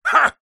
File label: human fight karate vocalisation male